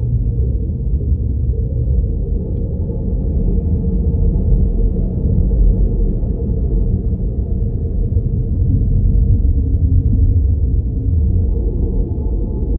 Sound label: Ambience